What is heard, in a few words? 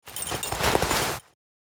Jacket pocket rustle rummage keys; foley